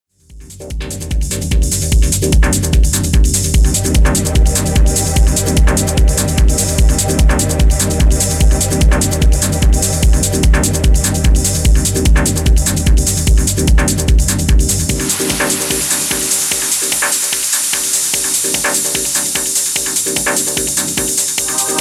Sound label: Techno